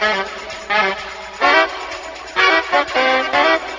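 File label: saxophone tambourine, Funky Drum loops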